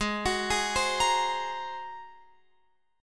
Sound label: G sharp 9th G sharp Guitar Open F; G#9; x,15,18,15,15,17; arpeggiated